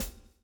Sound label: SWNG